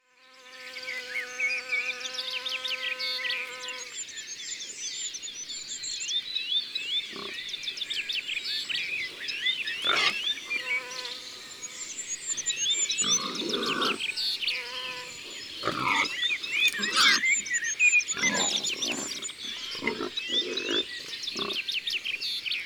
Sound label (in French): Sanglier; ABC de la nature